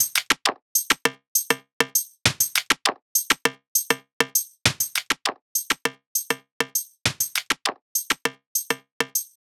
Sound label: Drum Loop 100 BPM Percussion, Midtempo; Cymatics; ANALOG EVOLUTION Beta